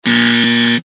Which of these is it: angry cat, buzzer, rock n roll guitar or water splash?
buzzer